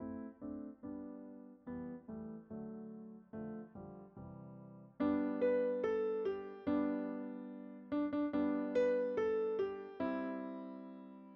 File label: Piano